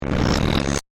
Static Voltage, Production, Element, Static, Voltage, Buzz; Buzz, Low; BlastWave